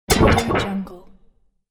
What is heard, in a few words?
scifi fail; fxprosound